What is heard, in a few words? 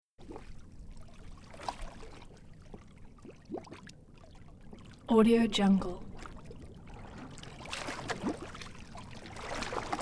calm water laps on a boat; hollywoodedge